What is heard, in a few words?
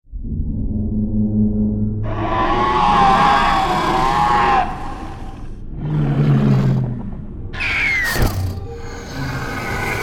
game creatures sound fx; evolved